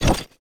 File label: Armor Weapon Impact